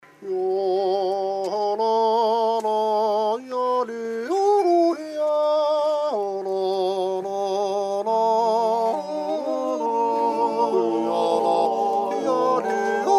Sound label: Yodel Appenzell; d'Ursnacht Sylvesterklause II